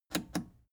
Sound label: plastic button scratch smooth click enable slow